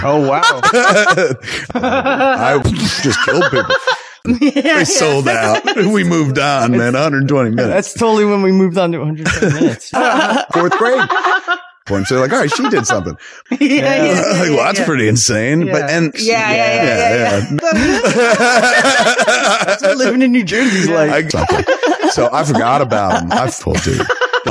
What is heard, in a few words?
Laughing; smodcast